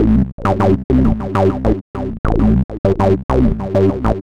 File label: arpegg